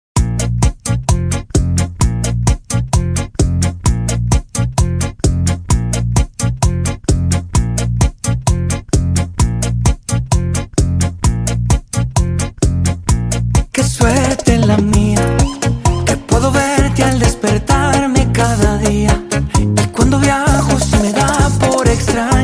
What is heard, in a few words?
BACHATA INTRO BPM 130